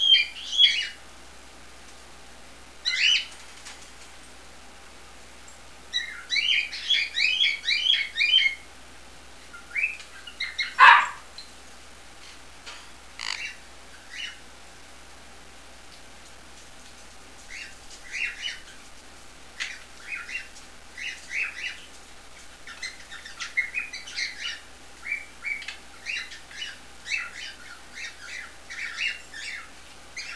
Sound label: Duet